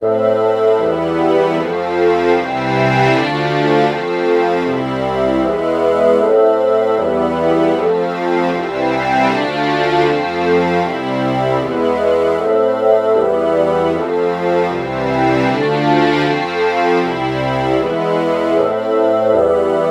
bassoon violin true chord morph

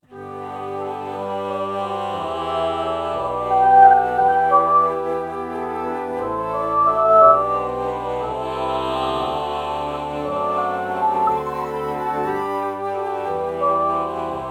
alto flute ah morph

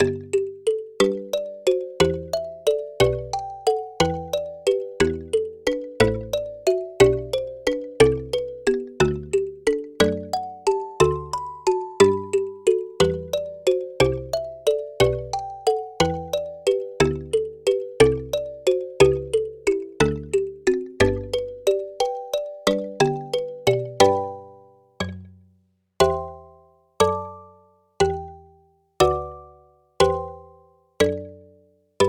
kalimba

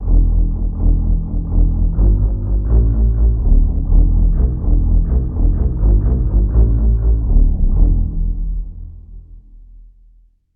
pizzicato section; bass

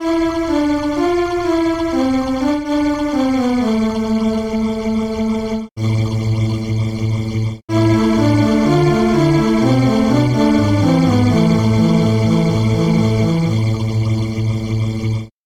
warm pad

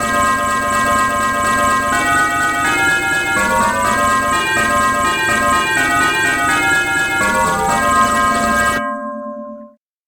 telephone bass

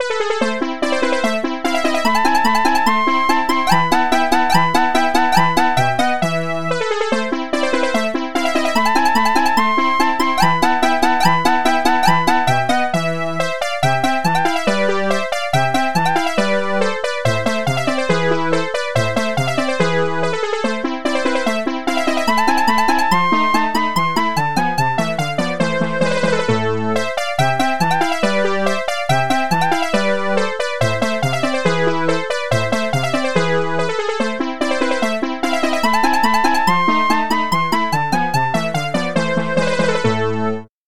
chiffer lead

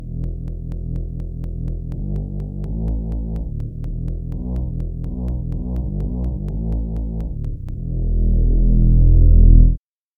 sweep pad bass

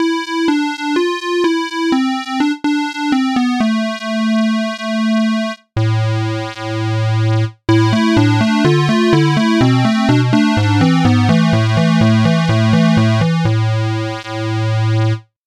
square lead

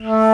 alto flute alto flute melody morph low

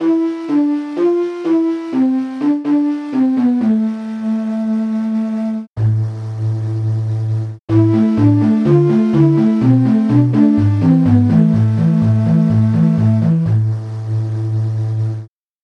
shakuhachi

